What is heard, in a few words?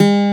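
Steel Acoustic Guitar A3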